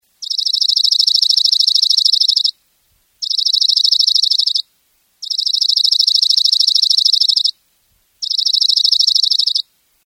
suara masteran dark eyed junco variasi

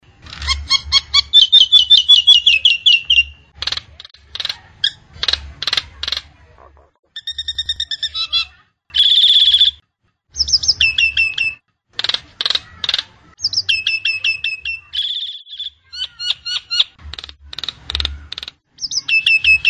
suara jalak nias

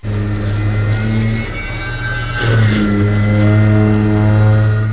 suara mobil

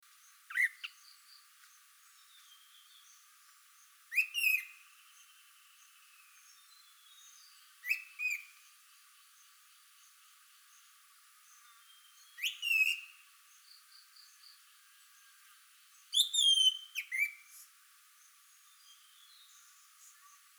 suara asli anis merah

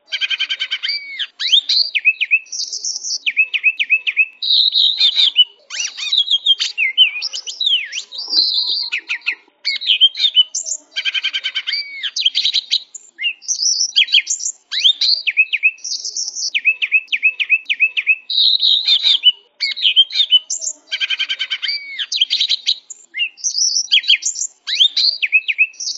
cucak hijau isian jalak suren

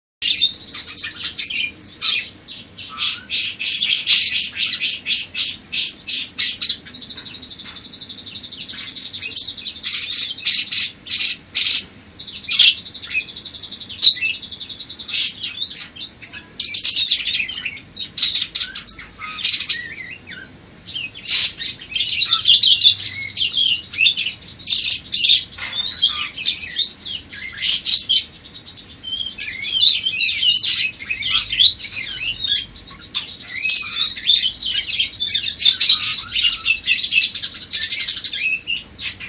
parkit isian cj lb cililin serindit gereja tarung

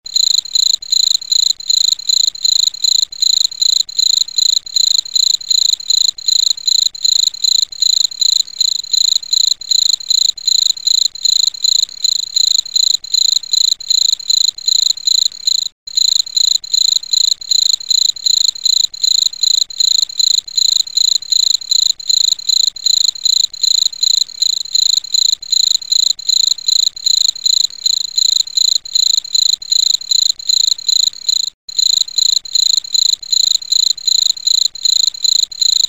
jangkrik untuk kacer murai cucak hijau

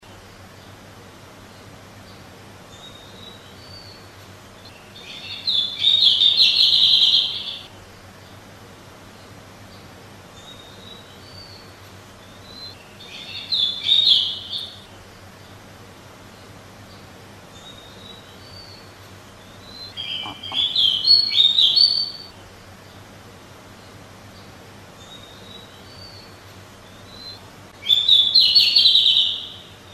suara kicauan burung decu

